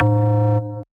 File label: Alarm Bass; Big Fish Audio; Old Skool House; OSH Bass